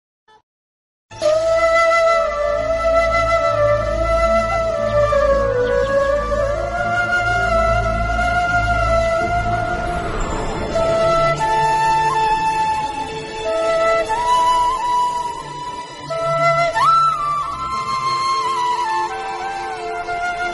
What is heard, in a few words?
sad krishna flute sad radha krishna flute ringtone; radha krishna serial